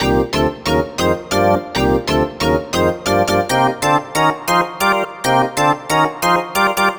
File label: Stab Organ